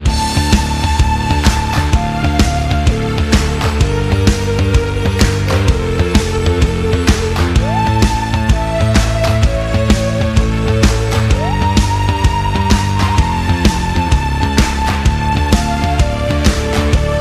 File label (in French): sonnerie